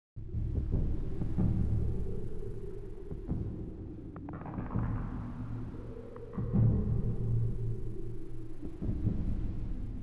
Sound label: background gunfire